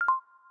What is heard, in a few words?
REW, Audio User Interface; samsung YP-U5